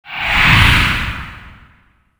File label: death grip; csgo; wcs go